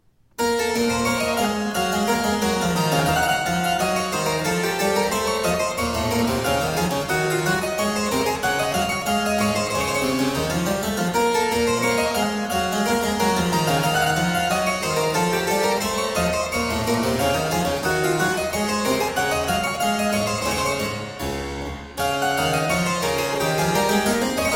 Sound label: Suite No 2 in C minor Air; BWV 813; Bach; Suites Francesi